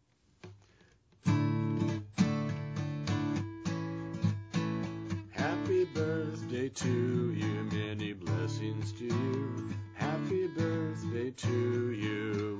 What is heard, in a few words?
birthday blessings song